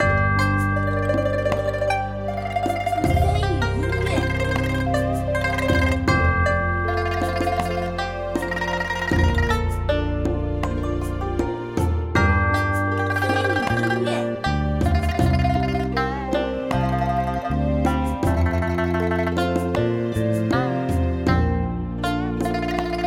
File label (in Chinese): 主背景音乐